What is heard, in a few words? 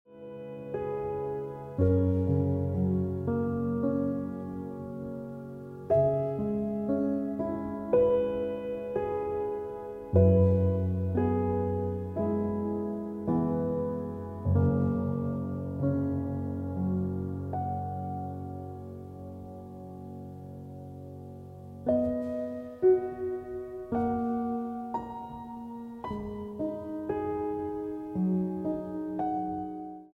Dont Leave Ringtone Piano Music